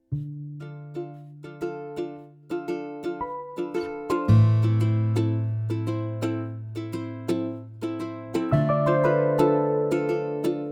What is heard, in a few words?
Instrumental version